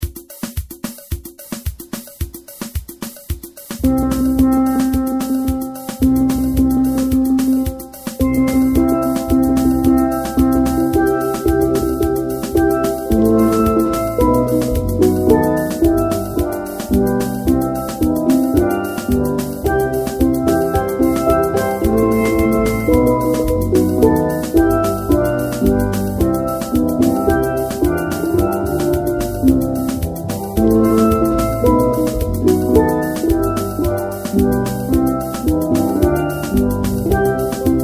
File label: recessional soca, steeldrumband